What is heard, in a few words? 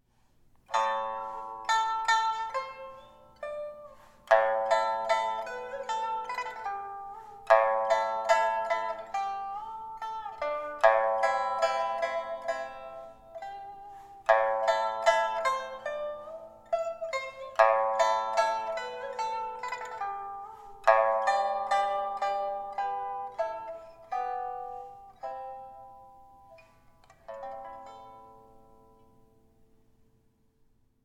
Pipa